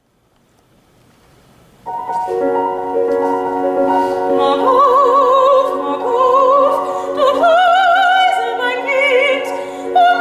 [F] serenade singing opera German the prettiest piano part; Strauss - Ständchen